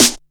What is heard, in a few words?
snare, Disco House; USB Soundscan vol.20; Fresh Disco House I; AKAI